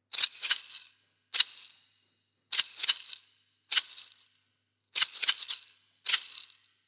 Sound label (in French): impro maracas; loto sonore